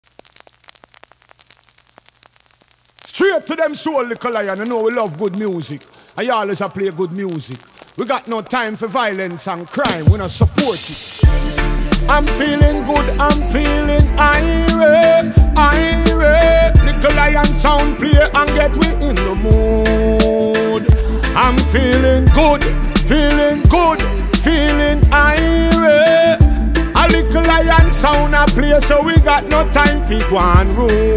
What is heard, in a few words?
dubplate chill out